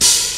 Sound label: natural open hat one shot d key, Royality free open high-hat tuned to the D note